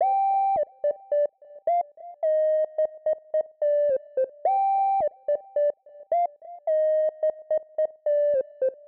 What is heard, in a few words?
HipHop Synth G min; Digidesign; Pro Tools Essential Loops